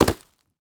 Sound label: Foley Footstep Soft Sole Dress Shoes Leaves And Twigs Debris Jump Quick